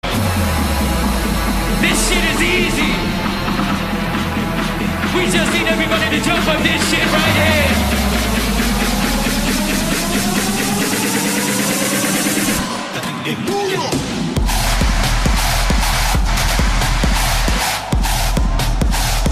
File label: trance drop the beat